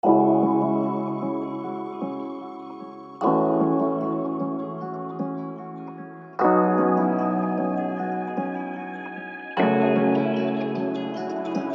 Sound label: C#m 151 bpm